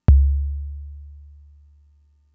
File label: Kick, Drum Machines Fricke MFB-522